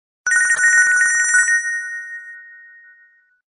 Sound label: call waiter